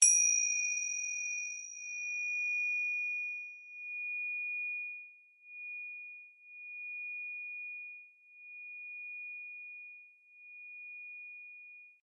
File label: tingsha; tay tang